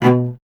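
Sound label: strings cello B; Miroslav Vitous; Solo Instruments VOL-1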